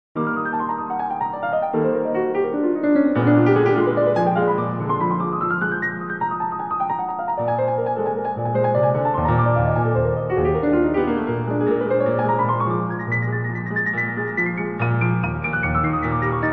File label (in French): Etude de virtuosite en mib; Roger; Etude de virtuosite 1 en mib